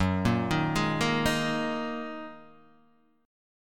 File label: F sharp 6th Add 9th Guitar Open D, chord